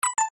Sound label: UI Rollover Major, User interface feedback sounds